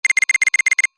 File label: Electronic military device beep; Military Equipment